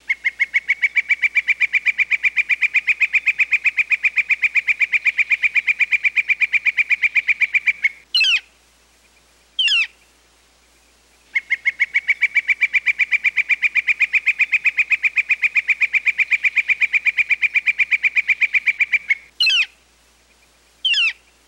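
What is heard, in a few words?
Red Shafted Flicker; Fletcher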